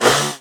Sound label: pre attack; dota; shredder